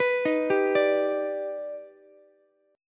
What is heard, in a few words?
D sharp Augmented Major 7th D sharp Ukulele; arpeggiated; JGuitar